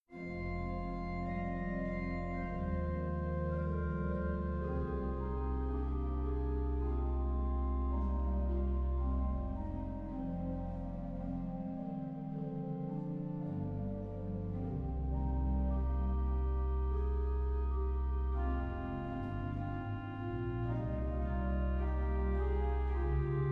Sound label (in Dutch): Orgelsolo; Psalm 77